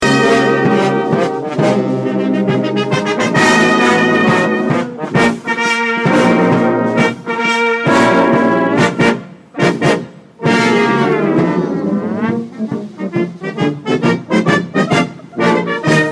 brass band

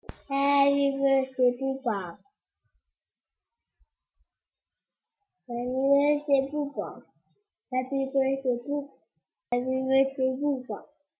sings happy birthday to his daddy

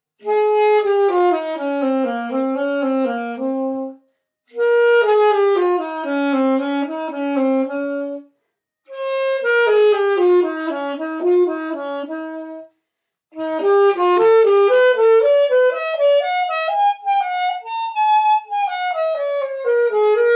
F major and C whole tone

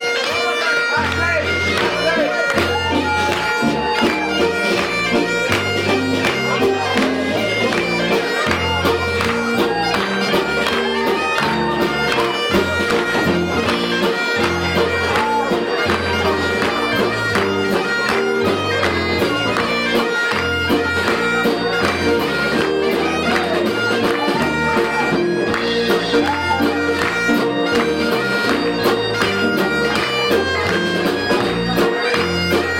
scottish wedding march

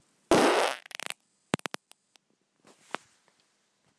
another fart

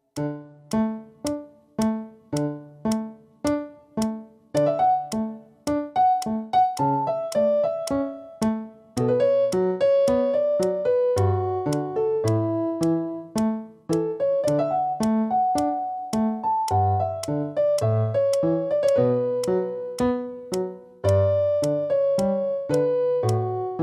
piano

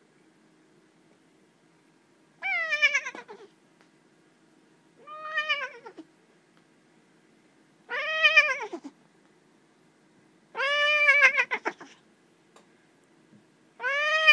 shaken meow

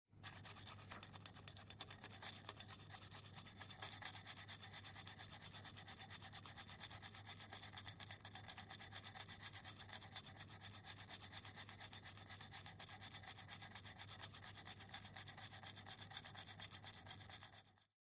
old toothbrush and near empty tin of dentifrice

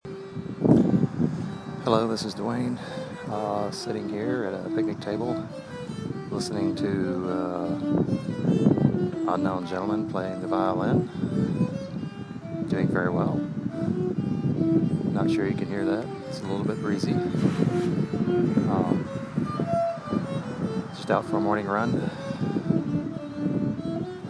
music

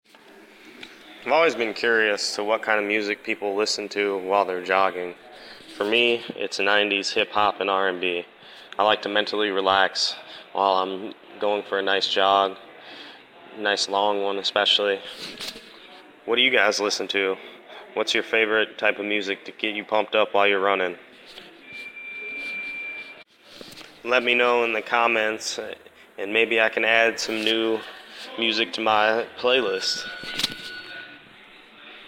running music

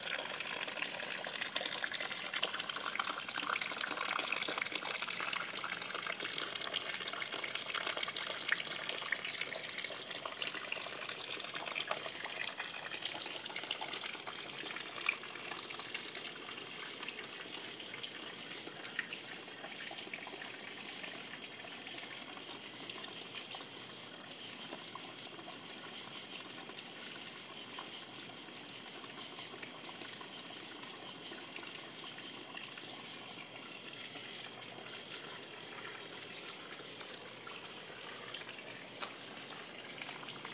humid sounds in backyard schenectady

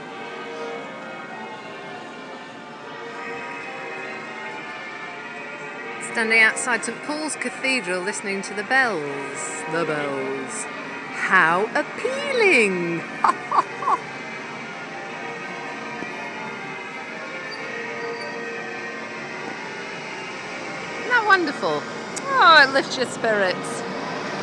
outside st paul's

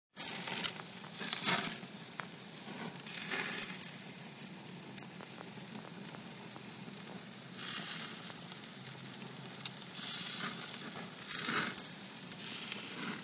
bacon wrapped jalapeno peppers sizzling

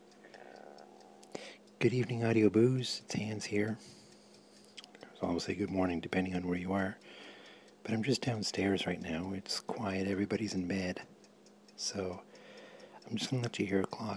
sound of an old clock

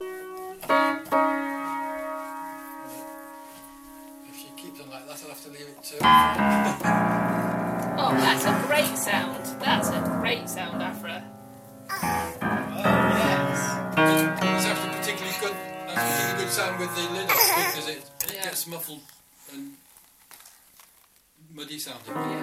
first piano lesson

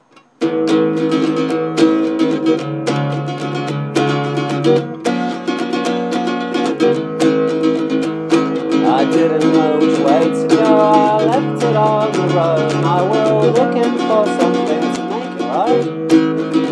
improvisation

